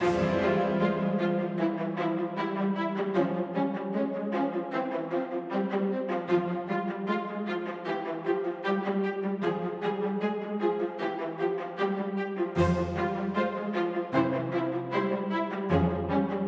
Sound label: In-Game Tune (Strings)